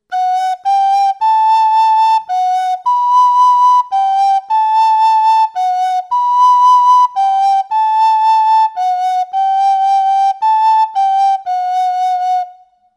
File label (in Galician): vibra sopro, Pito Galego